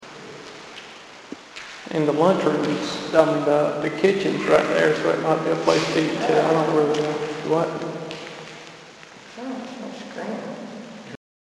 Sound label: Screaming